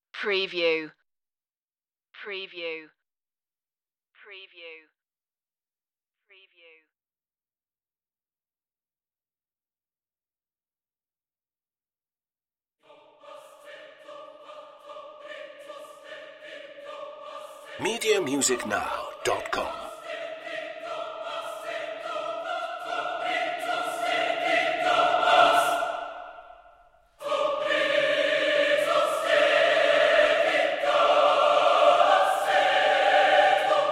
choir only

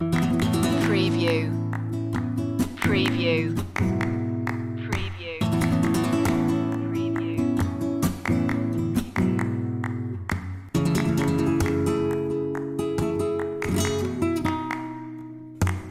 solea por buleria loop

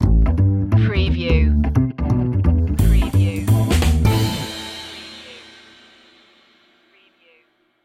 swing stinger

